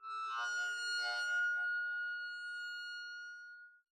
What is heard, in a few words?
arco noise harmonics, double-bass